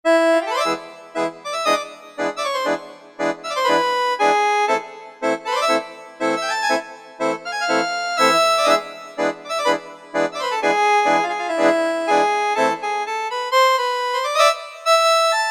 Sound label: Bandoneon; Generalmusic Equinox